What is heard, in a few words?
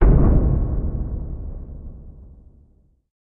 Removable Impact